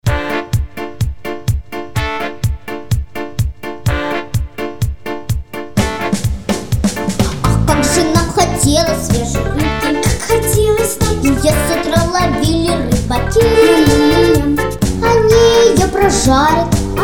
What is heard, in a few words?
Duet